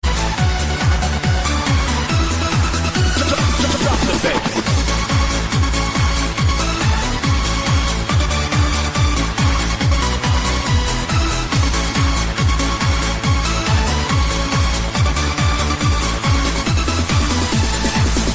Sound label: techno christmas ringtone